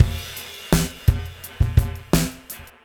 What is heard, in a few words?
Beat Loops; Zero-G; Monster Beats